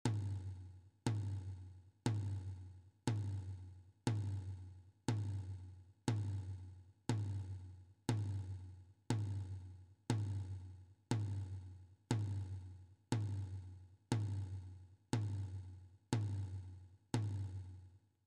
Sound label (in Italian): Grancassa, Banda, strumenti